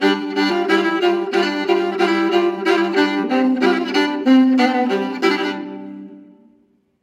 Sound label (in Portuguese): Musica Sting Vitoria, 92bpm (Rabeca); SubBoss